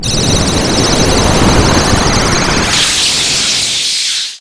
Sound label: power up